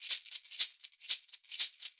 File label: DRM